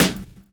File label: drum chop; AMG Gota Yashiki Groove Activator